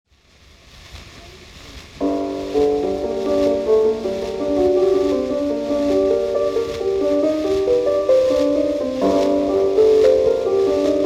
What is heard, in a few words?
Piano Concerto No. 2 in G Minor I. Andante Sostenuto; Op. 22